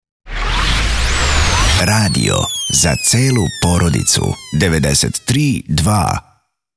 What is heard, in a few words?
sweeper